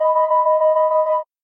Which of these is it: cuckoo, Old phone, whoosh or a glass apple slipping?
Old phone